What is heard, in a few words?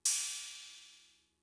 Cymbal, Fricke MFB-522, Drum Machines